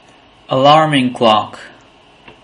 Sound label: alarming clock